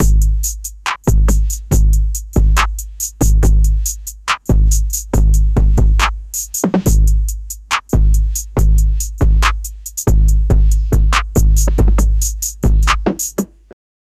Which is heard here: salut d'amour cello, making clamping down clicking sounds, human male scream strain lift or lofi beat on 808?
lofi beat on 808